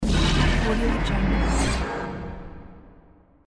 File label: dark sword enchant; jorgesound